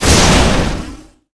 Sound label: Crush Strike; Qickskil